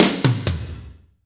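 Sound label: slow shuffle, Roland drum beats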